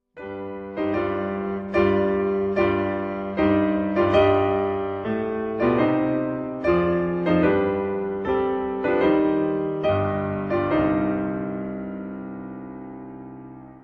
Impromptus for piano in C minor Allegro molto moderato